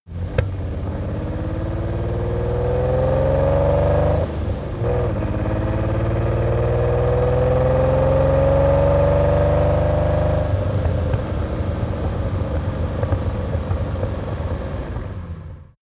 car lamborghini countach start driving gear accelerate